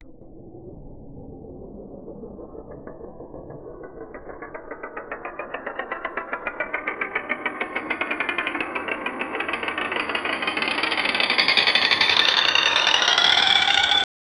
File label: wheel of fortune spin